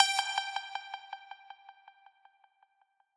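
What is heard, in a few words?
b pluck1, note G octave 5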